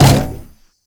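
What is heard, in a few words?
Launch Shroom Projectile Bounce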